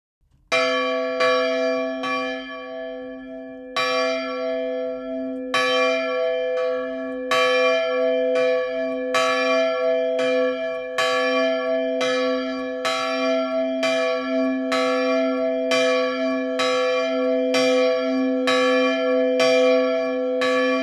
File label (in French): cloche 5 la claire